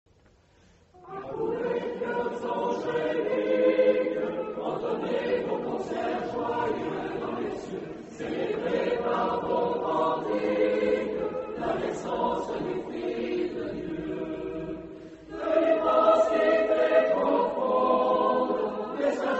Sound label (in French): Accourez choeurs angeliques